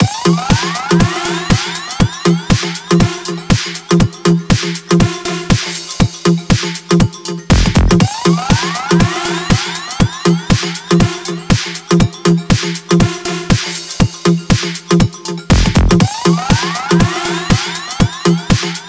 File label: Disco Loop; Zisko